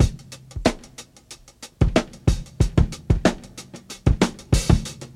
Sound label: 93 bpm drum loop sample c key; tuned drums c key